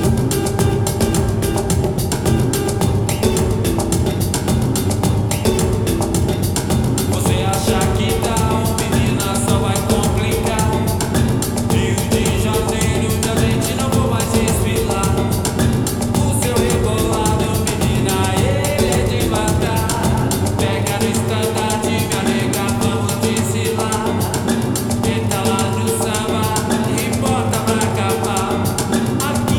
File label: Ze Do Samba